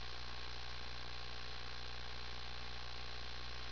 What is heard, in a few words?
gate; race into space